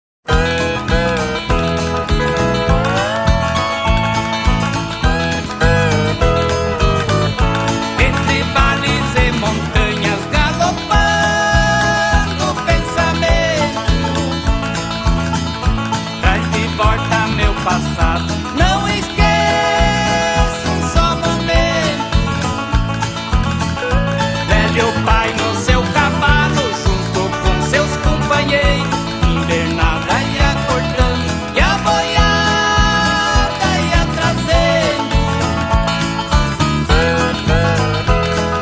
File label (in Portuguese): Sertanejas; Sofrencia